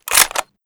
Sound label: bolt forward; weapons; csgo; ro2 k98; css